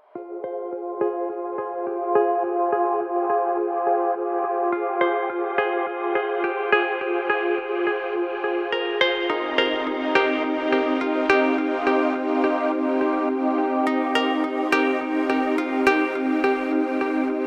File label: Deep House Remix